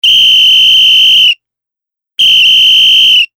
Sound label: police whistle